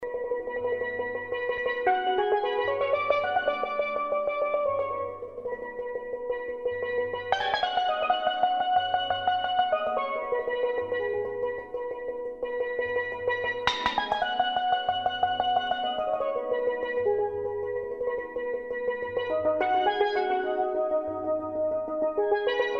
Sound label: wailing steel